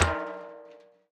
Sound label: fiber tom tom rim shot percus; cyber drums 2023; drum kit; nova sound